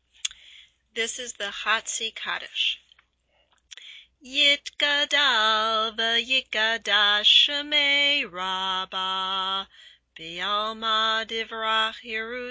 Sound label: chatzi kaddish